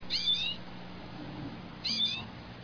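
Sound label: common redpoll, birds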